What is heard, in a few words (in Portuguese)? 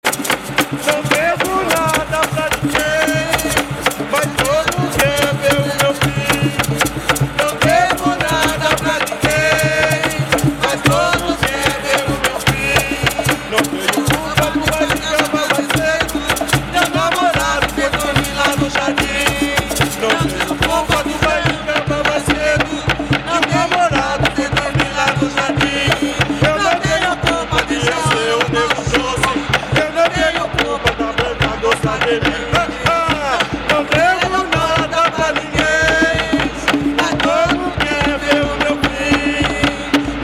batuque de umbigada piracicaba nao devo